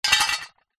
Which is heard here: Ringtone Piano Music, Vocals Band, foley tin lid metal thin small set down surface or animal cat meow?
foley tin lid metal thin small set down surface